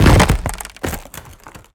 creature wood footstep medium; Bluezone Corporation

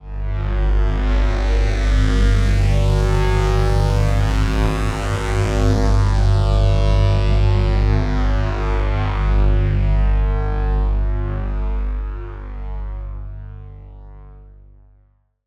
texture synth; trailer; Bluezone Corporation

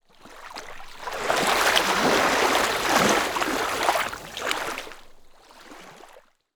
isolated lake wave; water; Bluezone Corporation

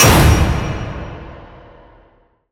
hit classic; Cinematic Impact; Bluezone Corporation

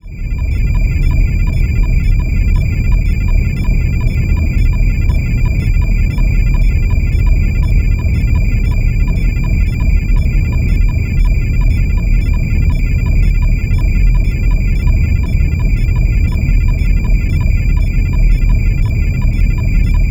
background cockpit ambience, spaceship; Bluezone Corporation

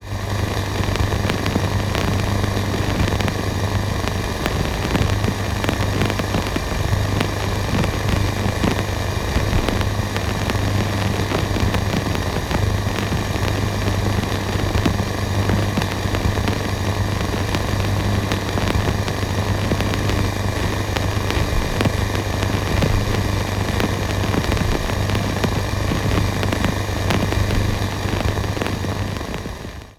radio transmission hum reception; Bluezone Corporation; Steampunk

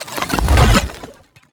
invader whoosh scifi organic; science fiction; Bluezone Corporation

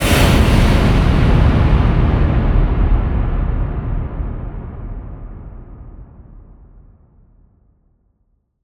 cinematic metal impact; Bluezone Corporation

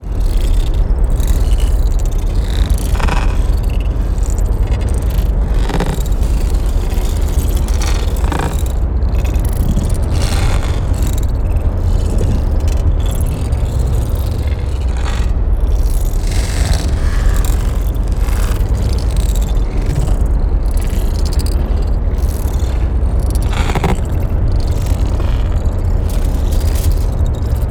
black project cinematic ambience; Bluezone Corporation

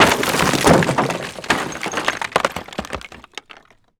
combat drone debris debris rubble texture; Bluezone Corporation

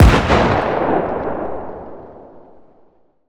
explosion outdoors; Bluezone Corporation

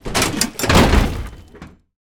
steampunk mechanical metal element heavy; Bluezone Corporation

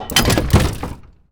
annihilation mech mechanical texture; Bluezone Corporation

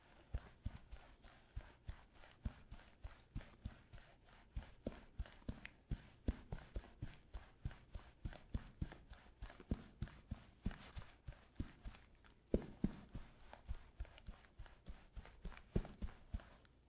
Soft Carpet Barefeet Running; Todd Blankenship

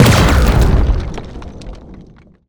soundwave weapon scifi explosion debris; Bluezone Corporation

